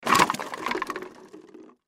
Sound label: Crash Bottles Plastic Soda Pop Empty Spill; Crashes; Sound Effects:Objects